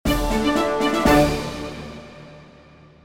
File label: Level End Success; Tower Climb